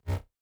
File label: organic sfx